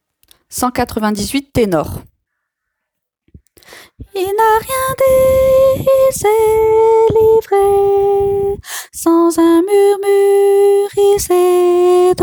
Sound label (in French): ténor